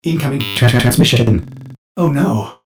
Incoming Call; Ordis

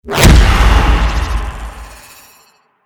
Soul Punch; Nekros

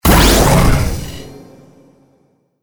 Vortex; Vauban

